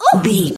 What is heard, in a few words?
voice female hurt pain high